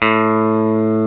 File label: KM GUITAR; E-MU Formula 4000 Series Vol. 3; Analog Odyssey